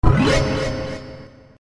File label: coin